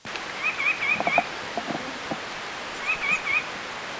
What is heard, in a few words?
White breasted Nuthatch; woods; Ariel Foundation Park